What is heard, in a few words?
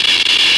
welding short; electrical sparks